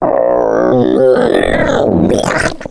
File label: female boomer lurk